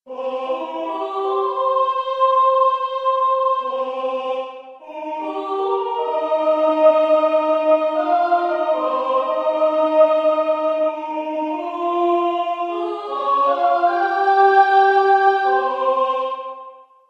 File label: soprano; Mesures 67-75 - Voix 1